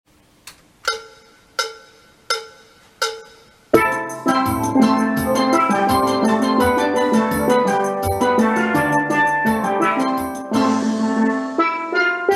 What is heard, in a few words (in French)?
ALTO PHRASES TUTTI, Steel Band